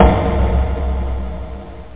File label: stamps